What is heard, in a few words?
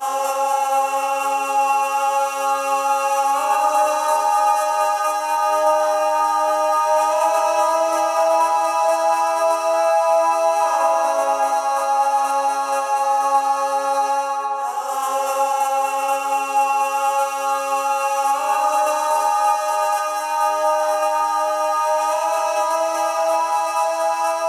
Call Me Parts Chor, techhouse